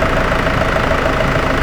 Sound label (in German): axial, diesel; akustik